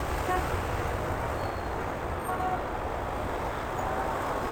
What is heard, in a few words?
Traffic Horn Honking